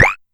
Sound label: Amdek Perc